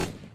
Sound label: Tokarev